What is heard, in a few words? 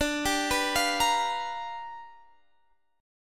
G 7th Sharp 9th D